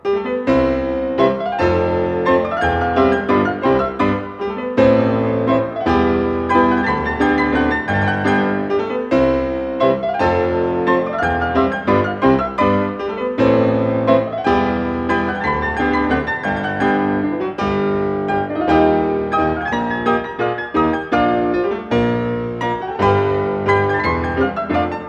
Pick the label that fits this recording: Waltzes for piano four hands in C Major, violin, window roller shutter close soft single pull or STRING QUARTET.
Waltzes for piano four hands in C Major